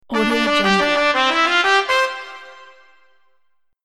trumpet win

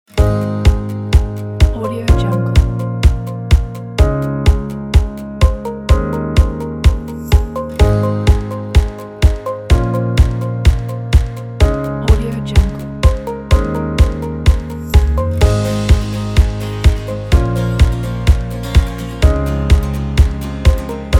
upbeat pop folk; audiotekmusic

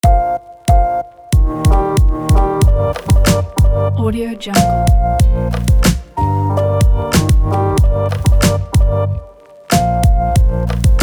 modern fashion hiphop kit

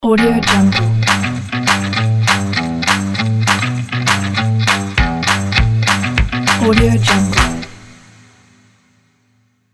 upbeat guitar ident

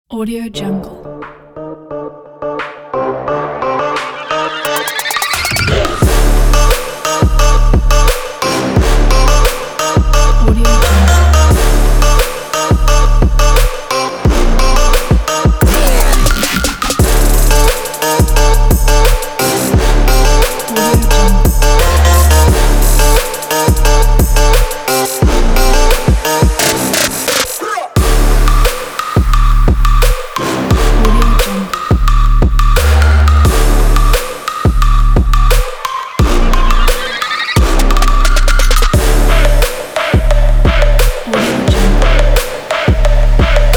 trap; blue-sounds

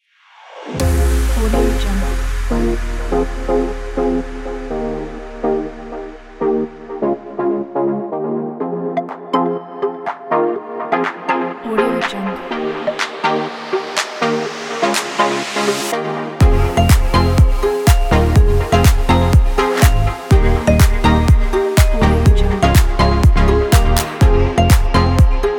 fashion deep background

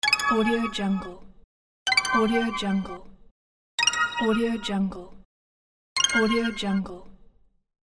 confirm button sound

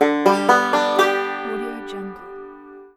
banjo winning score

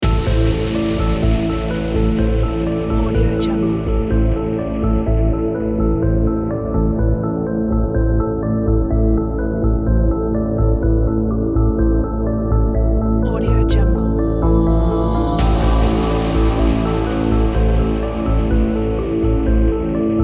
a tension

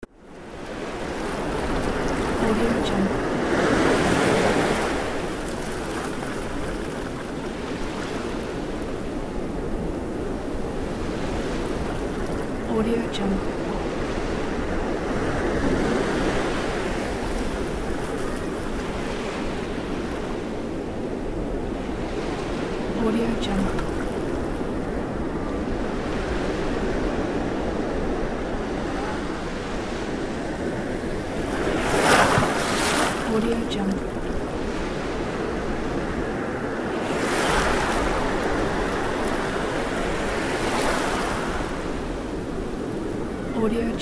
ocean waves; moonduststudio

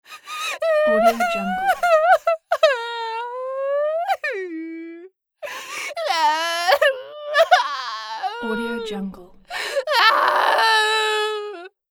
woman dramatic crying; floraphonic

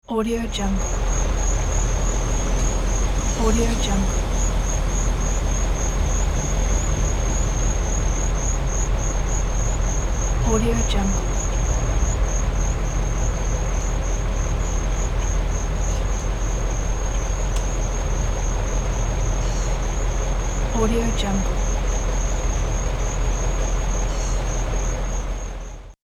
truck stop at night

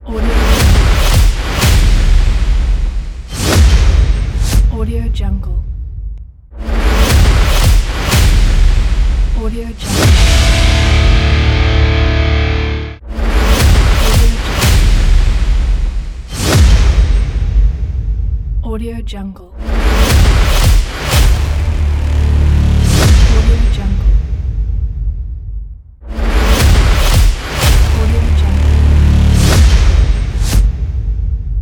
powerful logo

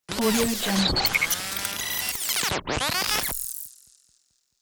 radio digital rewind glitch

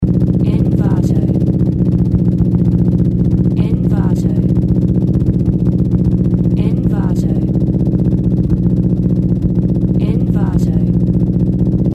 gasoline engine loop american

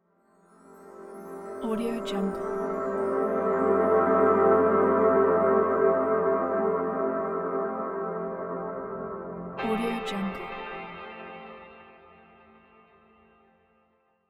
dreamy peaceful weekend transition

